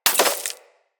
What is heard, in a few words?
Bullet Impact Debris Glass; Weapons